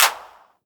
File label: CLAP; ER1 CLAP01 05